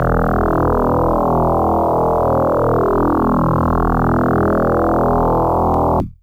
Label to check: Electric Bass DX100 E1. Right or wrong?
Right